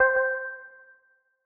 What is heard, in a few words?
PLUCK Downsample - C, Synth One Shots